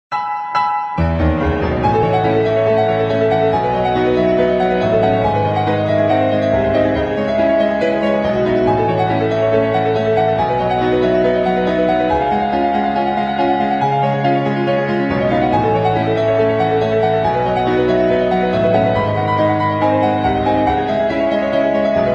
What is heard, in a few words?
bgm